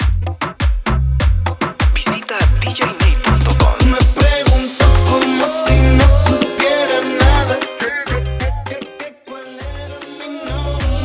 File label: Acapella Intro Hype